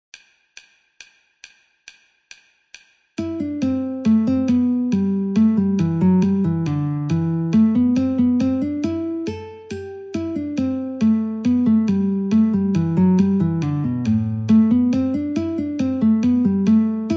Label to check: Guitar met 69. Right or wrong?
Right